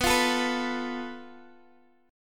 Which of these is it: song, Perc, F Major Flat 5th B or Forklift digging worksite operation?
F Major Flat 5th B